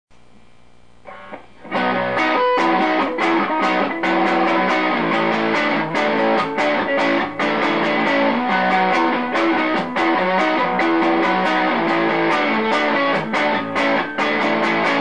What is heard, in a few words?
soft lead neck, gitarre fender stratocaster; Fender Yngwie Malmsteen Stratocaster